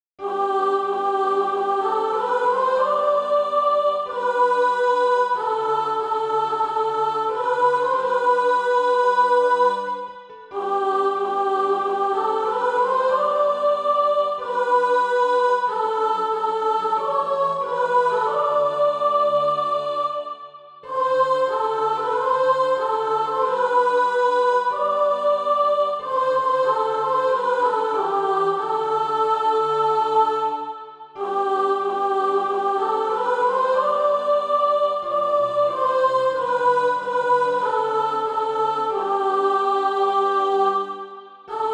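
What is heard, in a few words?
soprano, Practise Music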